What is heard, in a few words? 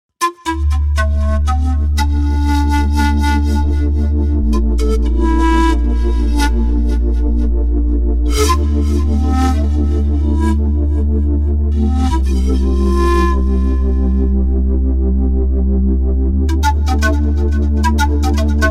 Pan Pipe; Pulsed Records; World Series